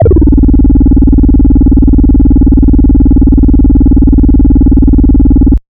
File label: Alien Bass